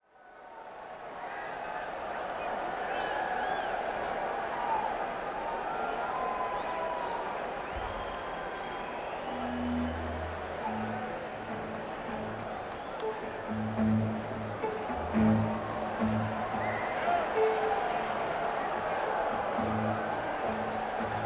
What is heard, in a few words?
Crowd; Alpharetta, GA - Ameris Bank Amphitheatre